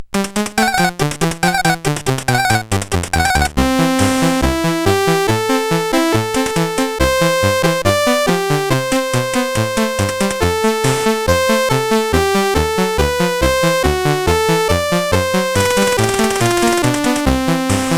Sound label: Fireworks Celebration, CSG8580R5